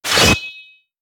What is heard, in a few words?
Fishmen Sword Attack Hit